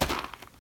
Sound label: gravel step; Golden Crayon Sounds